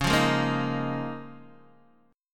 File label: Db 6th Add 9th Db down Guitar DADGAD